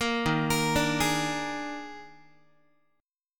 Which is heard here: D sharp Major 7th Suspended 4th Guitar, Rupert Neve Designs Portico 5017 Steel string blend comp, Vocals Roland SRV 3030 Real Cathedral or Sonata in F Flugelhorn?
D sharp Major 7th Suspended 4th Guitar